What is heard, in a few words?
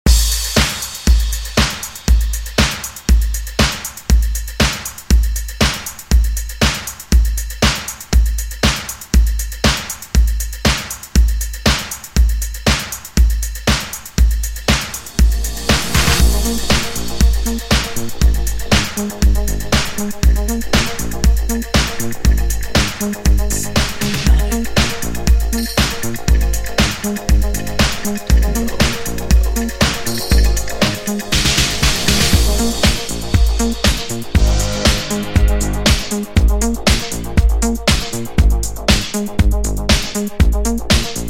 Redrum 161Bpm